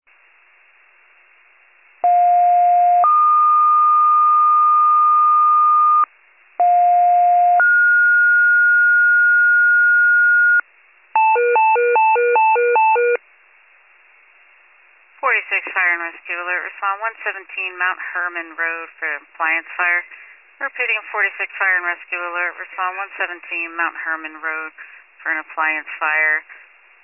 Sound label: FIRE pager alert